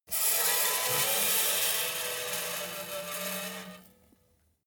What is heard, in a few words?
metal screech phaser steady long